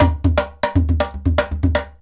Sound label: IJEILU GROOV; Best Service; World Colours